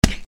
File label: punch